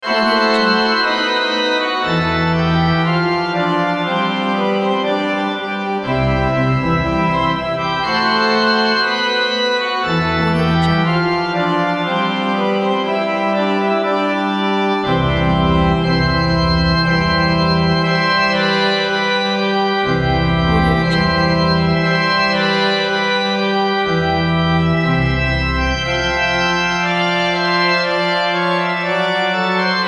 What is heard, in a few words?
wedding march church organ